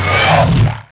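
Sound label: warrior chirp, bugs; Starship Troopers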